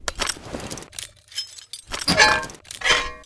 bazooka reload, weapons foley; Spearhead Demo; MacFormat 2003 June

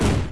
Small Explosion; Retro Gamer 17; 2D Shooters; galacticgladiator

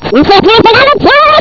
Troglodyte Death; Mythos

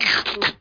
lick

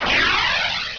Launch Missile

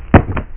Drop item; Realmz 2.2

